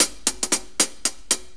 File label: 6-8 HiHats, HiHat Ride Loops; Drum Tools